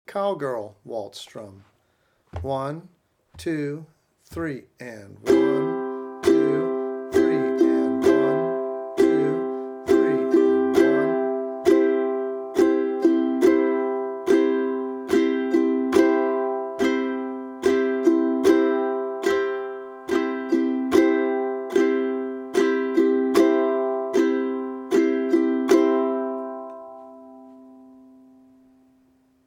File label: Cowgirl Waltz Strum